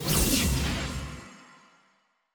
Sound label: victory point bar fill; clash; lol